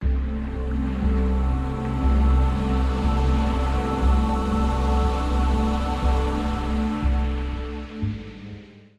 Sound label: Sleep Surf